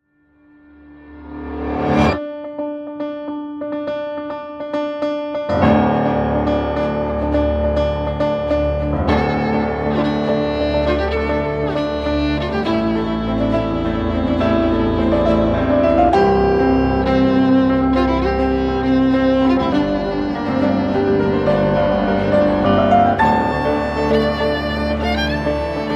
piano violin cover; pirates of the caribbean; game of thrones

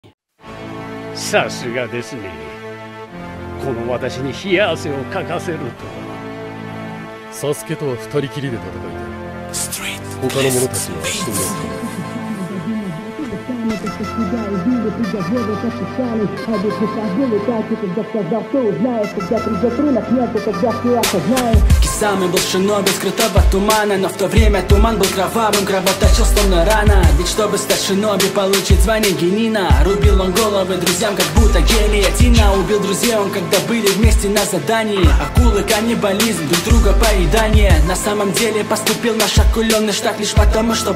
реп rap; animerap; реп про хошигаки кисаме; hoshigaki kisame rap

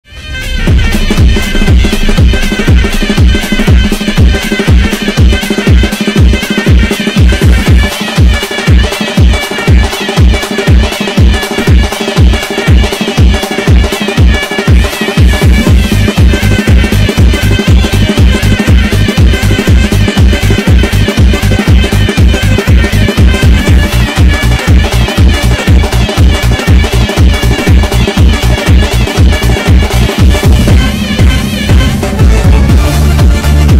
lezginka bass

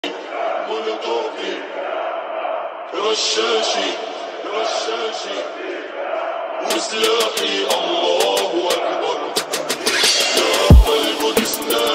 remix trap

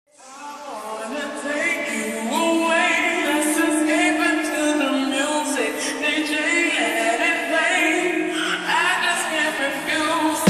phonk; kevin levrone

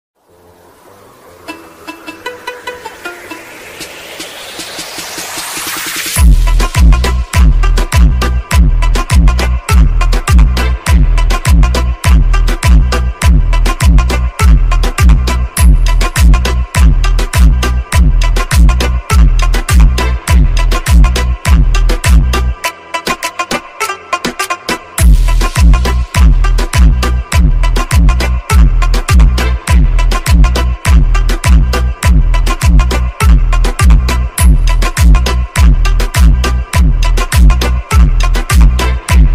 bass

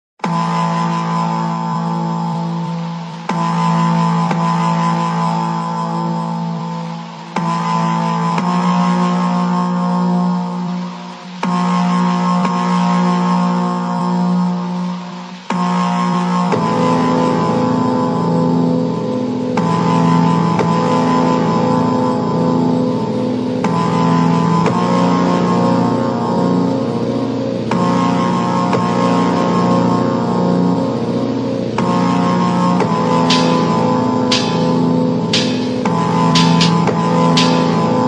super slowed tik tok sound

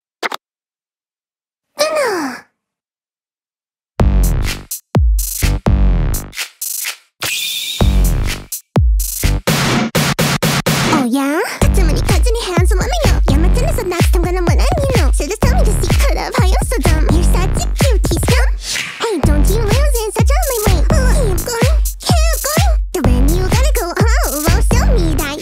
ENGLISH COVER

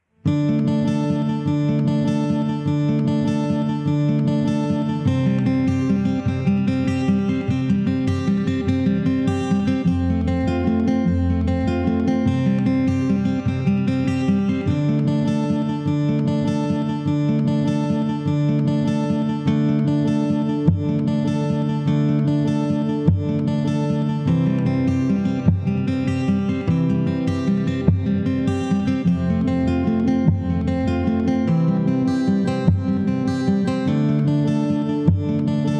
acoustic cover w harmonica; The Last of the Mohicans

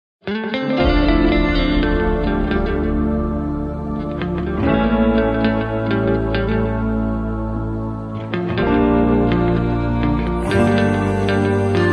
electronic rock

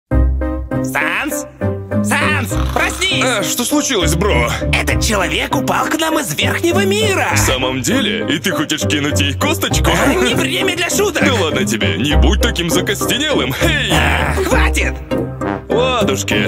rap; rus cover; Sans and Papyrus; Undertale

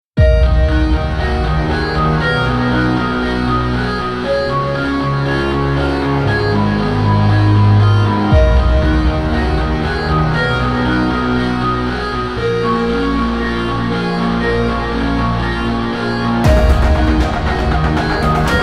epic powerful hybrid action

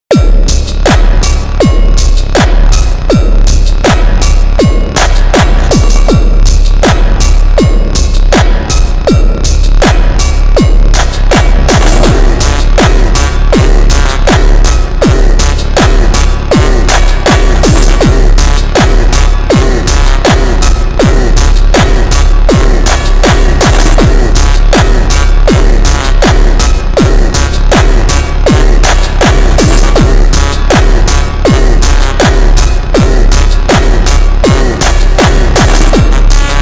ultra slowed plus reverb

